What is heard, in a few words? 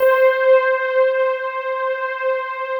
PAD; Best Service; XX Large; Pads